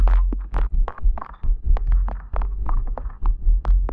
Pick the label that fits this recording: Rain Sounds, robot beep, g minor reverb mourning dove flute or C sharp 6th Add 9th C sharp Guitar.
robot beep